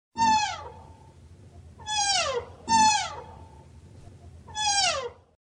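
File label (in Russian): fallow deer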